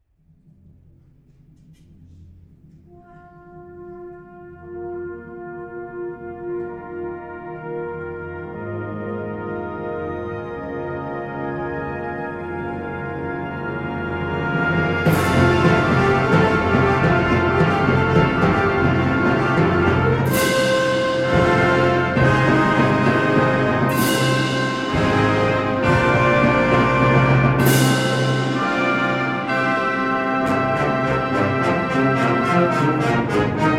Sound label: epic fanfare